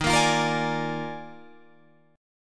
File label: Eb Major 7th Suspended 2nd Suspended 4th Eb Guitar Drop D; EbM7sus2sus4; strummed